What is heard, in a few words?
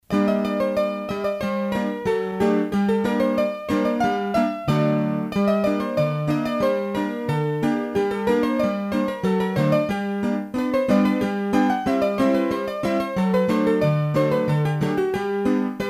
Scottische, hurdy-gurdy; Massif Central; Scottische du Marronnier